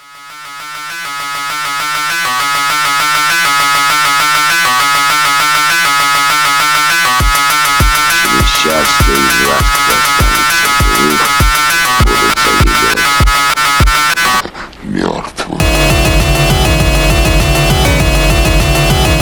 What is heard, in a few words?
Angry Phonk